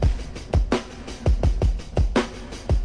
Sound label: noisy lofi break thud, drum loops and breaks, 084 bpm